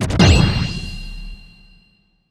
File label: Full Combo; D4DJ Groovy Mix; Android, iOS, Mobile